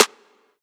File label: perfect snare g sharp key; snare drum samples; one shots